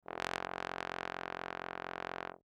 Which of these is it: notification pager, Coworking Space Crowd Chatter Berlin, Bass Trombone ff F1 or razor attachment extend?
Bass Trombone ff F1